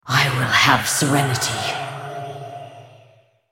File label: move; BlackIceAnivia